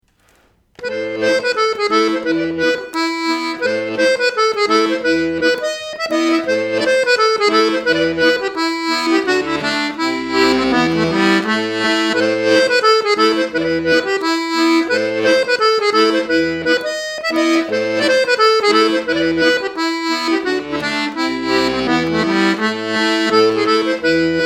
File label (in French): Scottish suédoise, accordeon